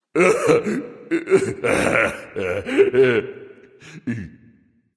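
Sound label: nonverbal boohoo; smite; voicepack; ullr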